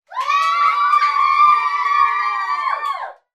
human group children x6 uk aged 3 to 8 shout cheer clap